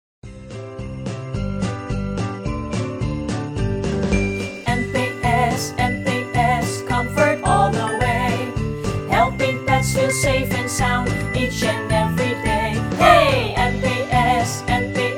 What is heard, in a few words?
Christmas jingle; merry christmas